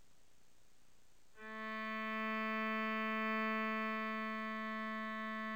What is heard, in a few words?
cello A